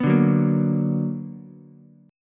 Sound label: B Minor Major 7th, Ukulele; strummed; JGuitar